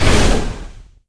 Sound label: dragon attack fire; Crypt of the NecroDancer